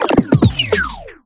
Tape Stop

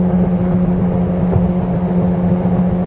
Vehicles Generic

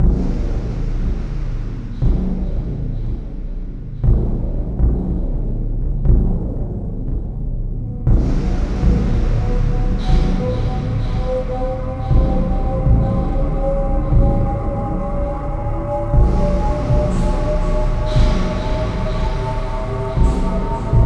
mine ambiance; the new adventures of the time machine

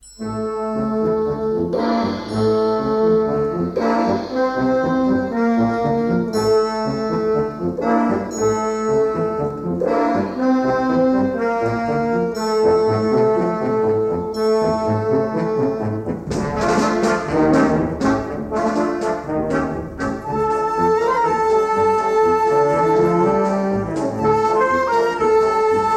Paso Doble; Torero Arte y Pasion en la Arena

